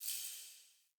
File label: Healing Totem Small Flower; Fey; Ward